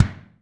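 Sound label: BD, bass drum; CONSTRUCT 4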